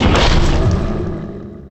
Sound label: VOCAL EVIL Impact Hit Slash; GameBurp; 2000 Game SFX